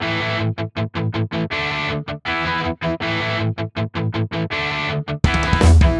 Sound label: power chord song intro